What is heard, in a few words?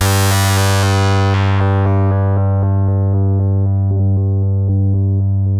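sample and hold G1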